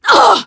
Wound; Sif

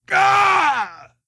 Wound Crit; Sjonnir The Ironshaper

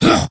attack, creature; Shadron

